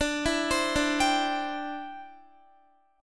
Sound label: D 7th Suspended 2nd Suspended 4th D, Guitar; D7sus2sus4; arpeggiated; x,17,14,17,14,14